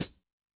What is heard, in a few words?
HiHat; pdl cls; Yamaha DTXpress-II